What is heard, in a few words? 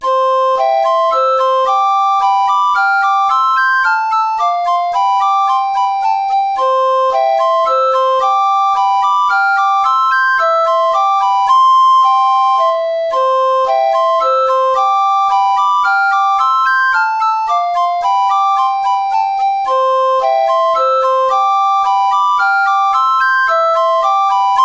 tempo minuetto; hook james